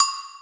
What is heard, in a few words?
Agogo